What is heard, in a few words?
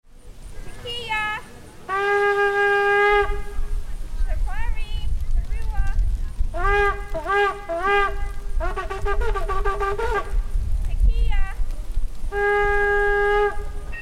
Shofar Sounding; COVID Essay